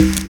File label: ZULU CLAP; Univers Sons - Kitch 70s